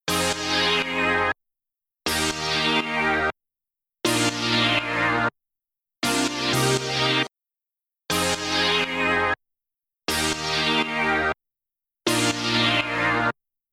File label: chords without reverb; fabfilter